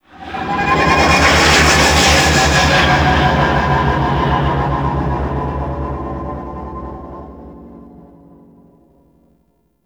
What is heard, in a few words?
Fly By Slow; Vehicles; Warframe; Liset; Liset Flight; Nora Liset